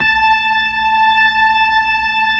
B-3

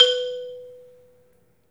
MARIMBA; 3D Sound; 3D Keys VOL-1

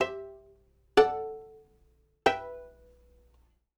strings, analog funk; Mixed Berry Jam; Sonic Foundry (Sony Creative Software) - What it Is! 70s Analog Funk

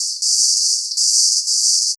Mole Bumpers, Fx; Sonic Foundry (Sony Creative Software); Esoterik Beatz

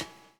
LOOP; X-Static Goldmine 2

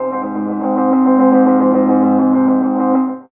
weird c; Chillout (ambient1&2)

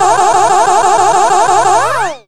GUN GAMES; USB Soundscan vol.27

